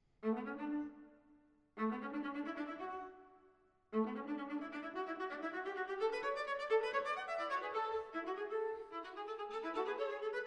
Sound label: Piano Quintet; Gernsheim